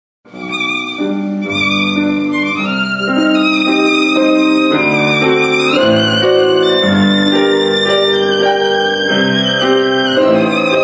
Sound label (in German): Gitare